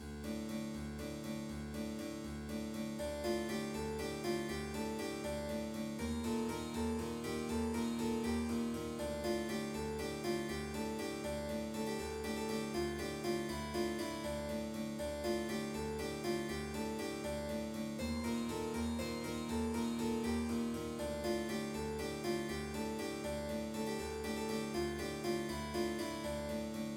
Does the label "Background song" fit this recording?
Yes